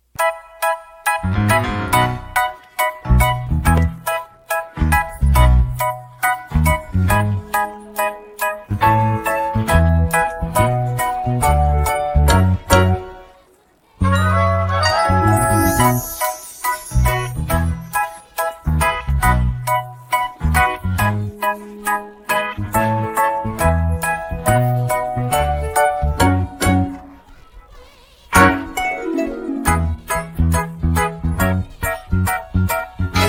Morning Person ACCOMP

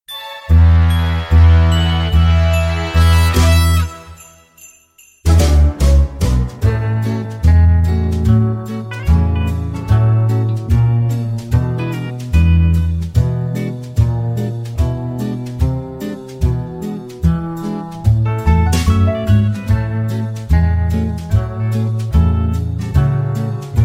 ACCOMP up one plus 1

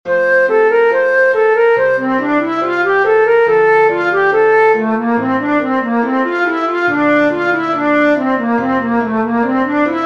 Canon 70bpm; Taco Bell Canon

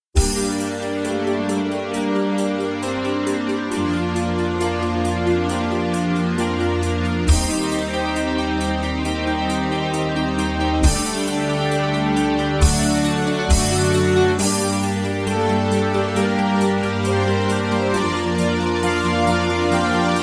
accomp up 2 full

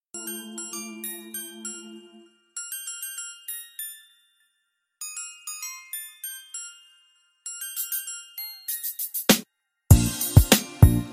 Karaoke ACCOMP

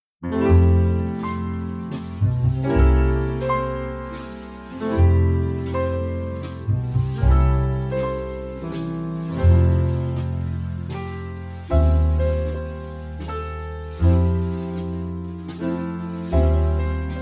ACCOMP F

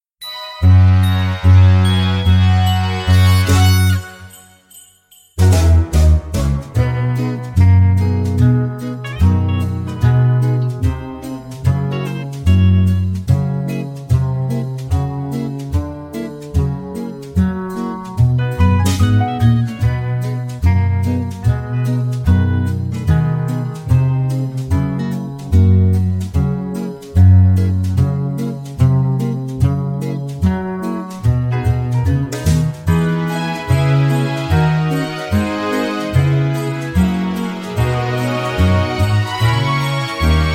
ACCOMP up three plus 1

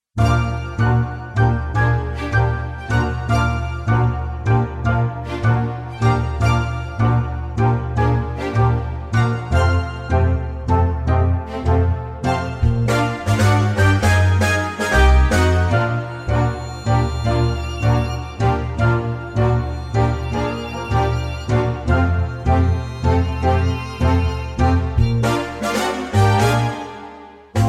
ACCOMP Bb

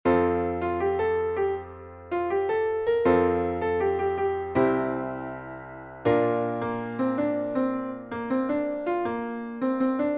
BASS

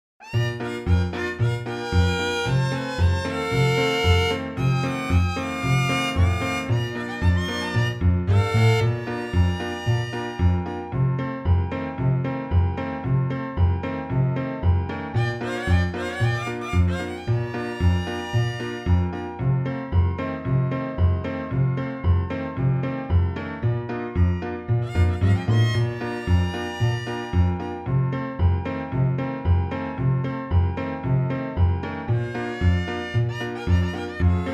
ACCOMP

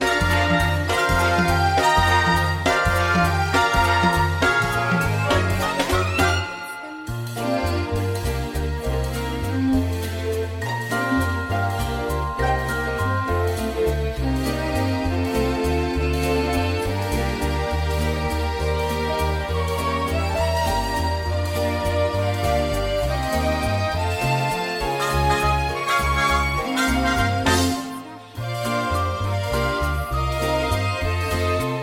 ACCOMP in Bb

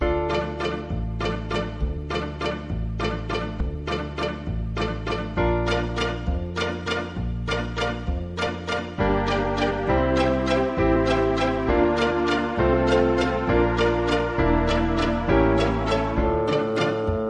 ACCOMP

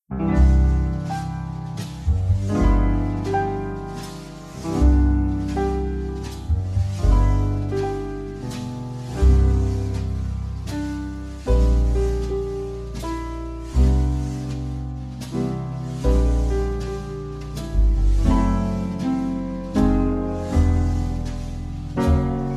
ACCOMP in C

